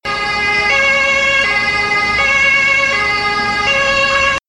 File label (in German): Hella Stadt, Blaulicht